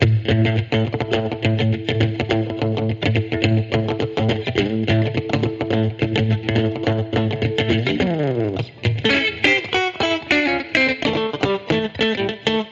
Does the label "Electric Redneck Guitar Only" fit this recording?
Yes